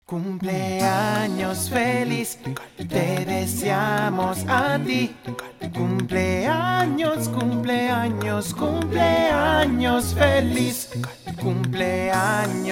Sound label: Happy Birthday in Spanish Beatbox